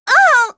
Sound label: Vox Dead; kingsraid; en